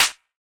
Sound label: Clap, Percussion; Sample Banks